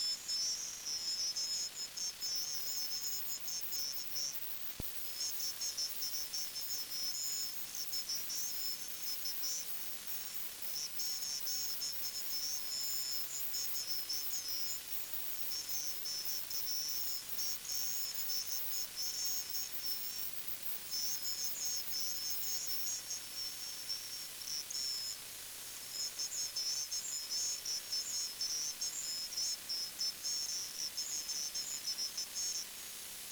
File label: winrad 145900 khz; sdr